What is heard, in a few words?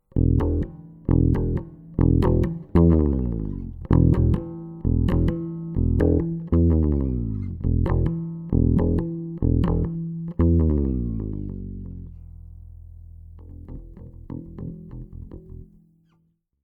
bass; In the Morning